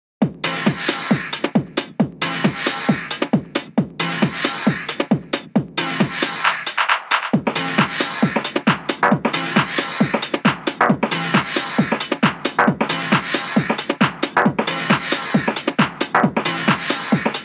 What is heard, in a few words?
BoomBox loop